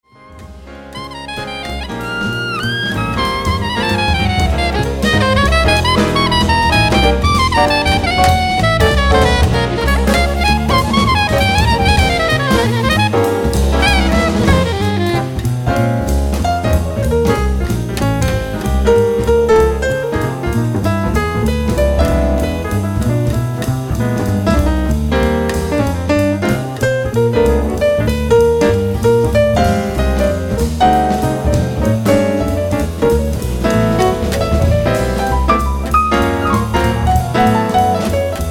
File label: trio